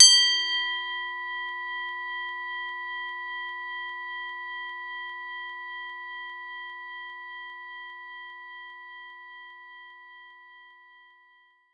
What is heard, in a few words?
Tubular Bells A5